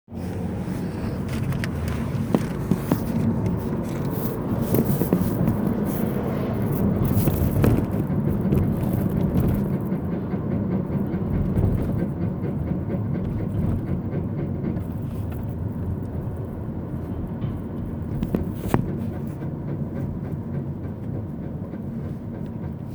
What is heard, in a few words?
back right bump sound, mazda; mazda noise challenge name that sound